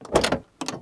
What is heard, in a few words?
door op; Mafie 1